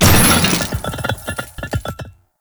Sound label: Loot Crate Large; Gameplay; Loot Crates; Warframe; Entrati